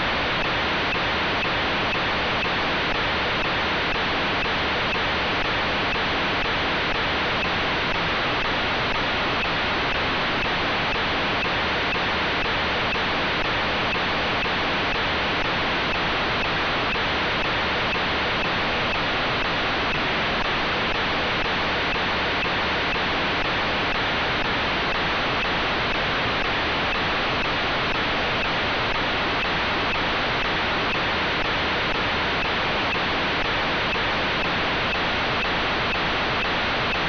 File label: 10500 Bd OQPSK